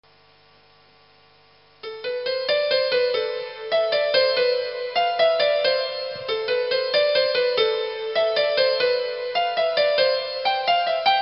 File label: solo